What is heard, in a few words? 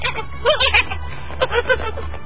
giggle; nickelodeon game; insanitation; gir